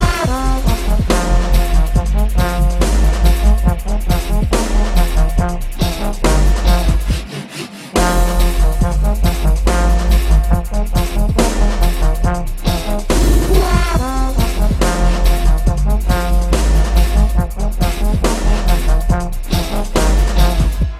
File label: Ninjastep